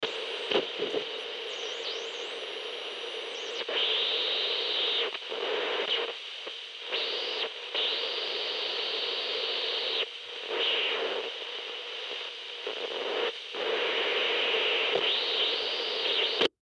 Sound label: Cb Radio Static; On Static Tune Off Glitch Harsh Screech; Technology; Miscellaneous Electronic; Blastwave